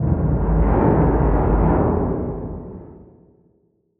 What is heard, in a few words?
Hull